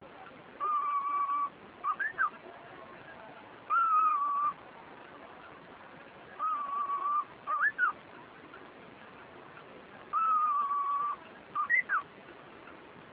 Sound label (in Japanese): ho-hokekyo; okame